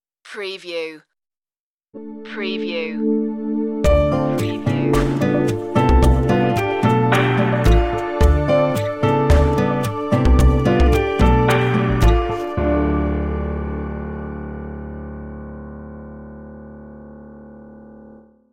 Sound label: lounge water stinger